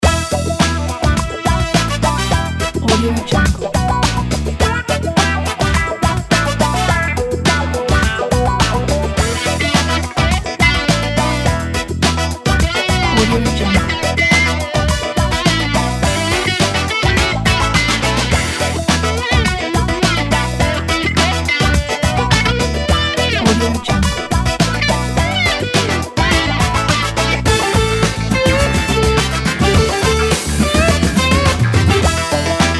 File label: vintage funk loop